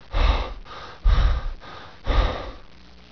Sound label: rename